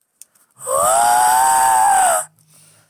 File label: Wheezing Zombie; COD